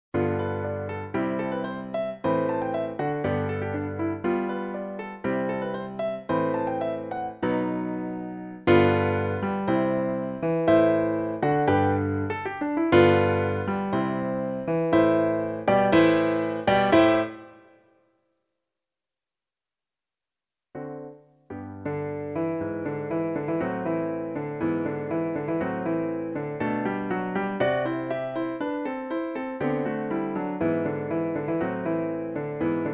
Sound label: solo for oboe and piano; オーボエ用伴奏; paladilhe